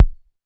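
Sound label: technox bass drum; Quasimidi Technox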